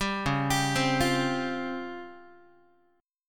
Db Major 7th Flat 5th, Guitar; 10,9,10,10,9,x; DbM7b5 arpeggiated; JGuitar

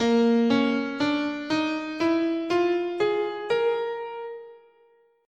Asharp Mixo Blues

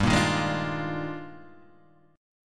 D sharp Major 11th, G, guitar chord

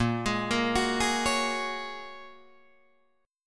Bb Minor 7th Sharp 5th, Guitar, Drop D; 8,11,8,11,9,9

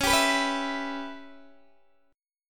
C sharp Minor 7th Flat 5th C sharp Guitar Open D

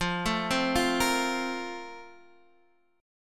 Bb Major 7th Suspended 2nd

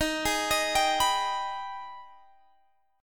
Ab Minor Major 7th

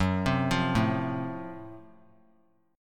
F sharp Minor Major 7th Flat 5th; arpeggiated

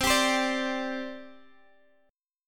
C Suspended 2nd Guitar Open G; down; chord shape

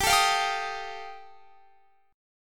G 7th Suspended 2nd Sharp 5th G chord

